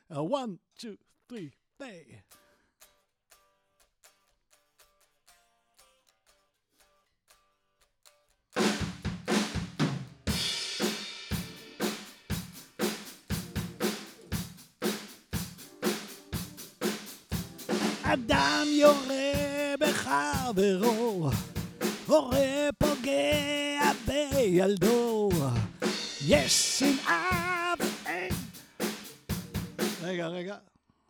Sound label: VOC